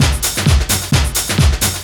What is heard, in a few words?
130 tribal, LOOP PERC TRIBAL; Techno Trance; DRUMLOOPS; Ueberschall - Techno Trance Essentials